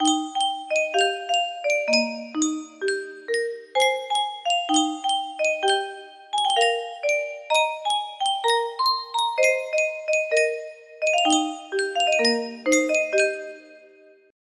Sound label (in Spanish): Yunsheng caja de musica